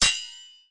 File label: match show; fishingduel